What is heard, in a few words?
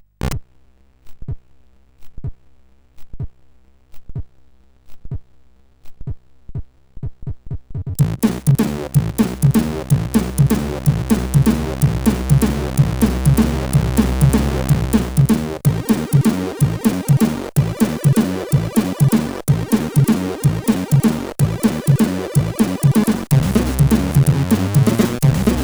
Intro, CSG8580R5; Intro Zak 1